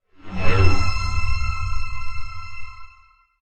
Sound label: win; connect hexas